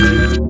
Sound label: menu; sindemo